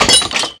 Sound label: clash glass; Super Smash Bros. Melee